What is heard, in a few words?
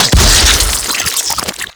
Infested Eris Cyst Explosion Single; Destructable Cysts